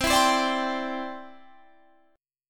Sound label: C Major Add 9th, Guitar Drop D